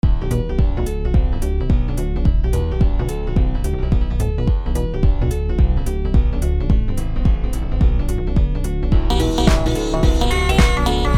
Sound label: thirds